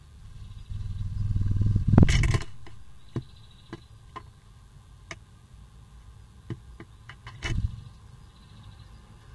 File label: sparrow visit, nestbox